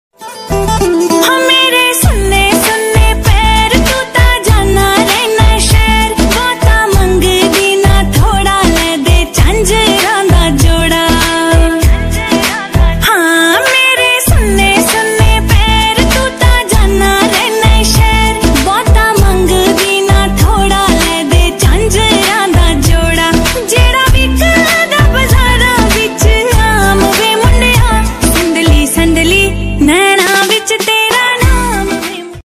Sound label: Punjabi Ringtone